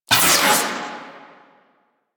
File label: Proj Tap Whoosh; Warframe; The New War; Quests; Weapons; Omicrus